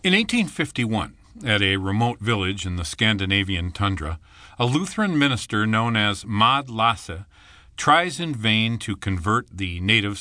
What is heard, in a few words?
drum time